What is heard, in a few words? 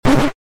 miss note; FNF Mid Fight